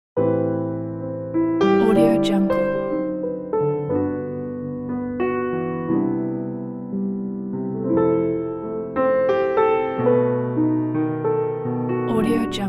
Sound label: smooth jazz cocktail piano